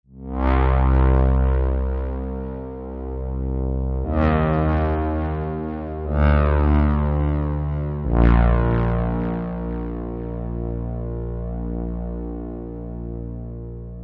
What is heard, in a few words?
sad pad